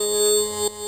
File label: bip; sven; sobrobo